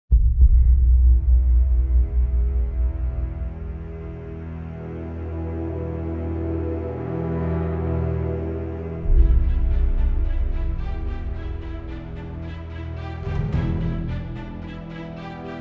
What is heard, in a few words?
Dungeon Exploration; Fort Triumph